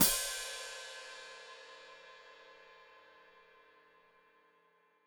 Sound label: Hat Closed Open, Hi-Hat